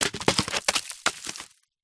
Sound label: foley bark piece fall off tree trunk hit ground; zapsplat